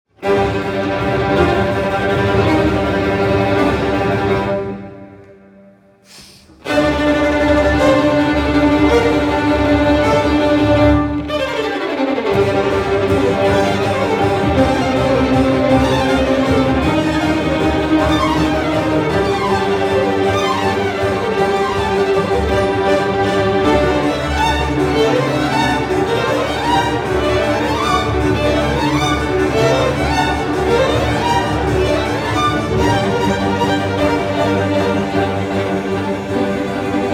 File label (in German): Klassik